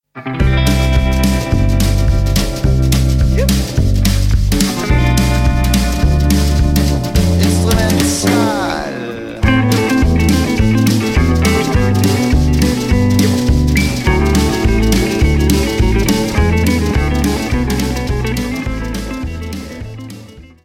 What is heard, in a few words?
instrumental